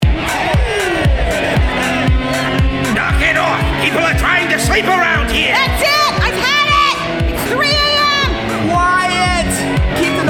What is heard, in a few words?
House Party Mix; Hallmark "House Party" Sound Card